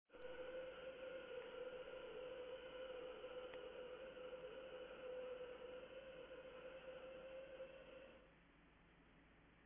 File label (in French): Volet